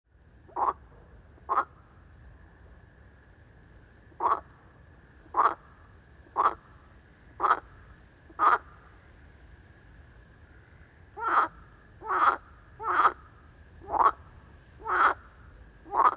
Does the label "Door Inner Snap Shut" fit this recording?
No